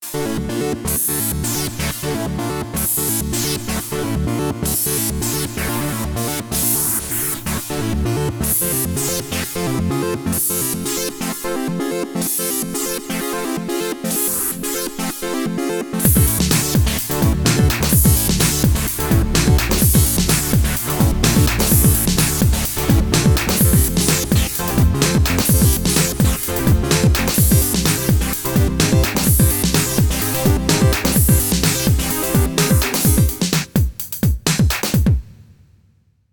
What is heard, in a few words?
Deep Phaser, Korg Radias-R